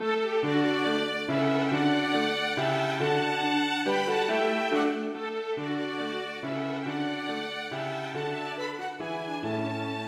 Menuet for to fioliner, cello og piano

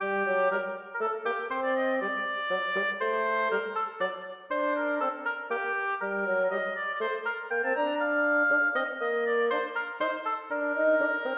Menue for obo og fagott; Bang

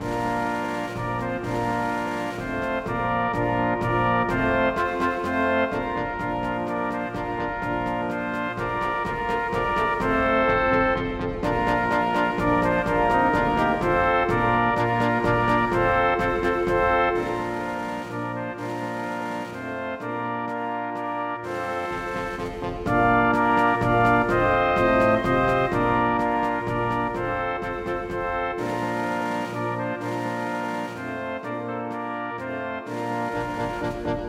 Duett arrangert for messingkvintett og ad.lib. trommer

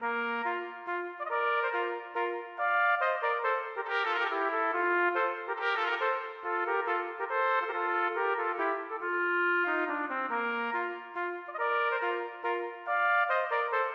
Menuet for trompetduett; Aamodt

